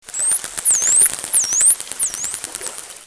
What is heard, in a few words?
tursi